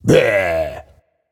tongue; smite; voicepack; ravana

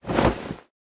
armor; smite; merlin

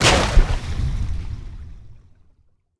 god sobek dive; smite

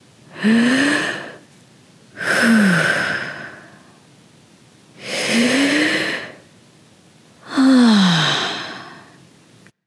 breath; smite; skadi

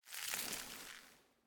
armor; smite; ganesha

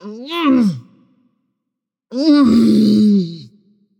nv grumble; smite; voicepack; morgan le fay

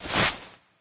swing; smite; hades

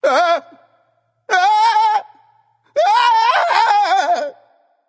nv fear; smite; voicepack; ganesha

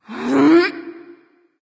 grunt lift

